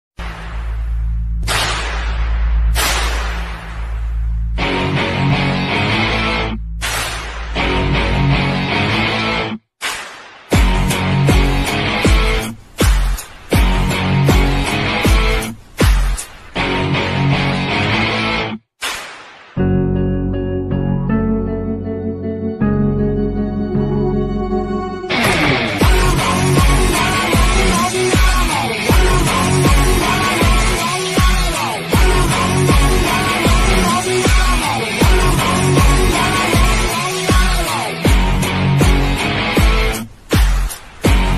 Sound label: arjun reddy bgm ringtone